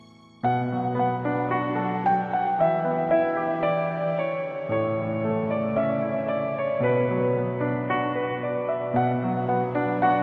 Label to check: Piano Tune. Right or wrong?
Right